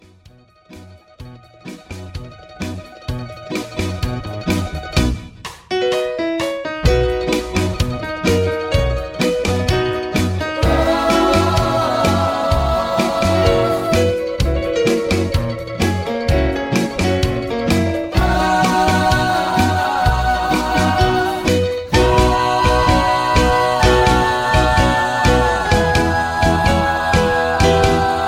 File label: Instrumental